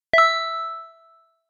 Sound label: text receive